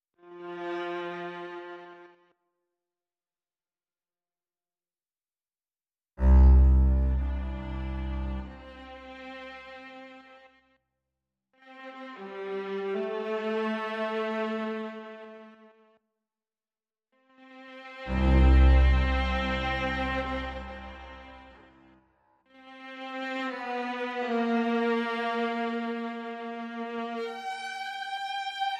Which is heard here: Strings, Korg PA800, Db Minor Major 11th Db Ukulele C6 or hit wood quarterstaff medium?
Strings